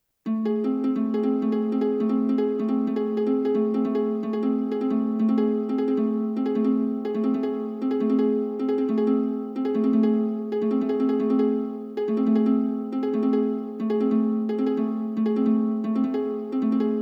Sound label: Rainbow Wave Flow Harp